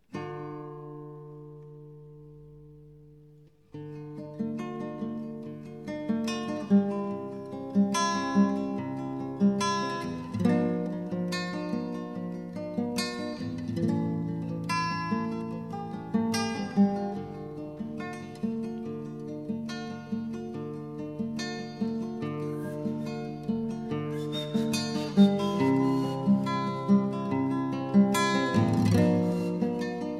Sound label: instrumental, persian